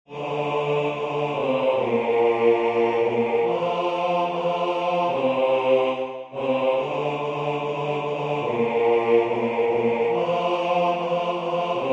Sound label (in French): Ne crains pas basse